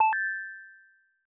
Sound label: Credit SFX; Blood Bros.; arcade